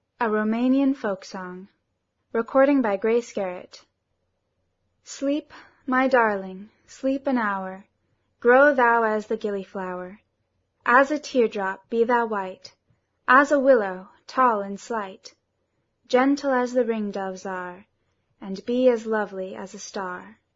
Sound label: roumanian folksong